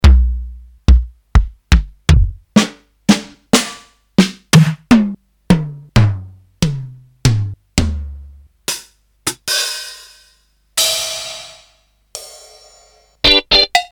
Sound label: jazz, drum, yamaha rx5